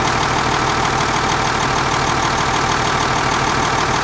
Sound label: van idle